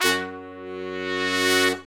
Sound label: BRS, Brass Sections, R&R Horns; Roland LCDP06; R&R Sect.sfz